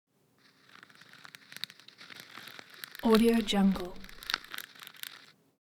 object hygiene floss pull out slow

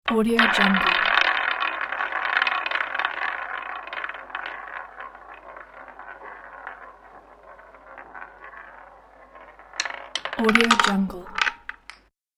game roulette

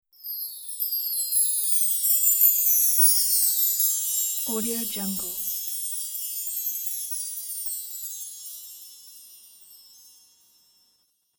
christmas belltree

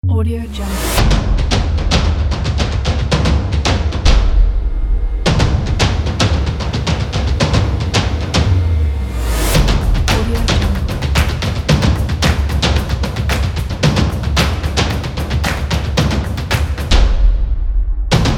sport power percussion

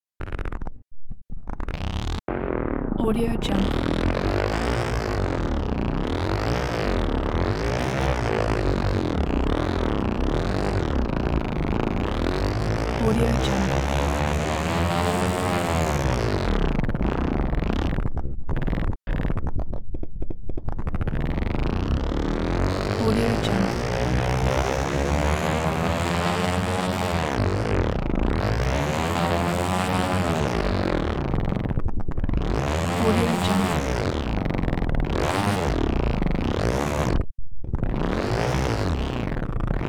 insect copter fly bys staggered movement

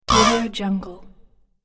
droid future response hit

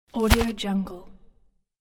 falling sack of cement

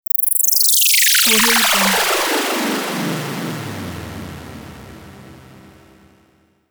lazer transition downsweeps; alenalt

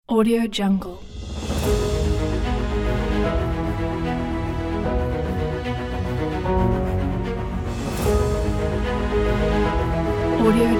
epic trailer

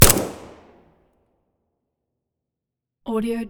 sig552 suppressed single shot interior; soundmorph

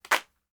applause; prosoundfx

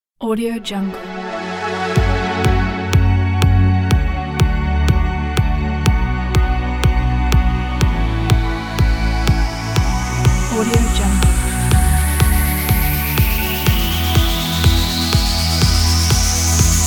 upbeat motivation

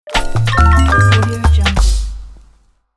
achievement stinger; nenadsimic

